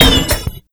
Hit, Fx; Sonic Foundry (Sony Creative Software); Esoterik Beatz; Bello

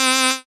synth, c, Techno Synths; Dance Synths

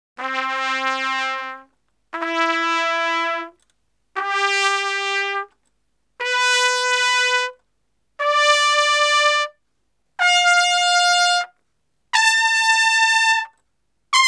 Salsa Picante; Masterbits; World Zone VOL-3

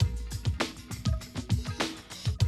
drum loop, 100 BPM

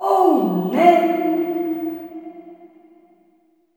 LULLABYE, SOLO FEM; Spectrasonics; Heart of Africa 2

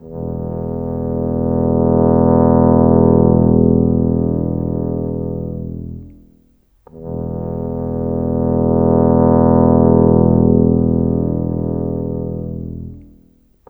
Trombone Tuba, Cinematic; Sonic Foundry (Sony Creative Software); Orchestral Series VOL-3 - Cinematic

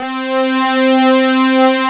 SYNTHS; Pro-Rec - Synthsonic

